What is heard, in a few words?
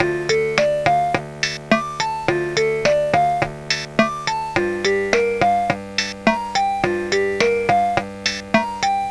title song; MacFoxes Adult Game